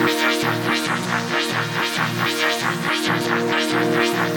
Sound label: Synth Loop; Sound FX